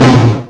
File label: Drumulator Tom, EMU Drumulator drum machine; 300 Drum Machines